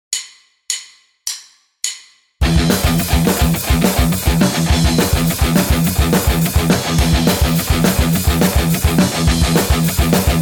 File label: Riff, guitar; Pickipedia: learn to play 23 rhythm guitar riffs